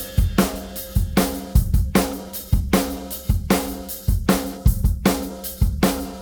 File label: drum loop 4 bar 4-4, 154